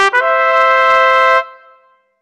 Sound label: dish complete